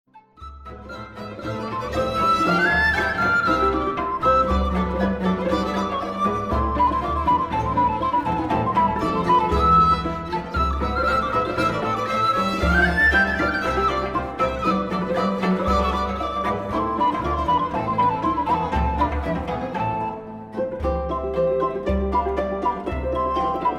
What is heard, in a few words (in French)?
trio en si min presto; TWV 42h2